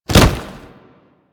Generic Grab; Warframe; Characters; Tenno

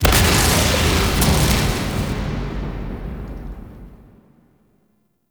Missile Platform Core Explode; Warframe; Grineer Missile Platform

